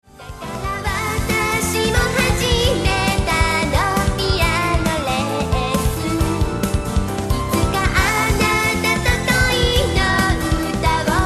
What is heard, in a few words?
solfege